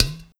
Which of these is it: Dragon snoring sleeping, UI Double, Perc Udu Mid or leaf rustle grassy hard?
Perc Udu Mid